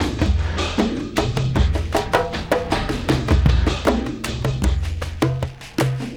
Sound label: Elektro Cym Swish, Drums; Sonic Foundry (Sony Creative Software); Friendly Fire; Marc Steve